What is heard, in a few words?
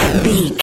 retro explosion general